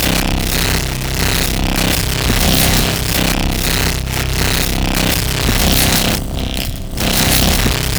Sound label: Beam Fire Loop; Gameplay; Mission One; Condrix; Warframe; The New War